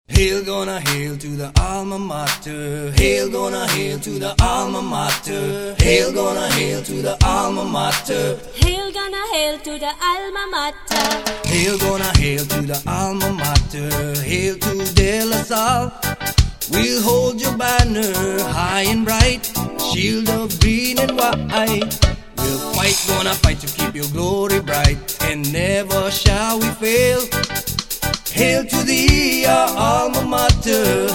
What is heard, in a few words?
alma mater reggae